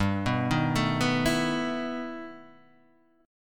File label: E Minor Major 11th G, guitar